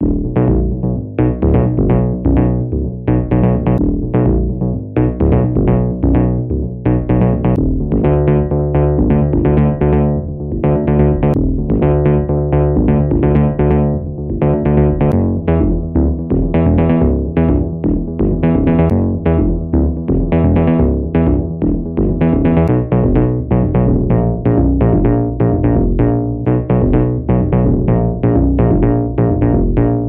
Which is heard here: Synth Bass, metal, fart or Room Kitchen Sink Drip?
Synth Bass